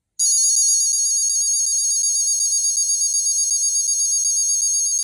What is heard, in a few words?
galaxy transformer light sound yellow, robot toy